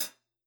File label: Hat E2